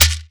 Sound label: Shaker, basslike